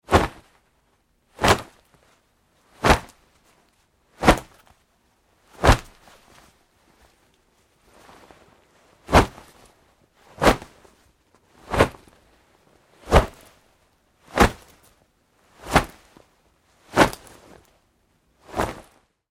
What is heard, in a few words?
Foley Fight Moves Nylon Shorts Singles Hard, wrestling and struggling; Big Room Sound